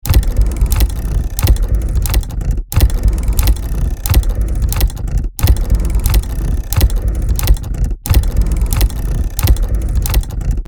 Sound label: Heavy industrial machine running loop